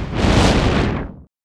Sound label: hit fire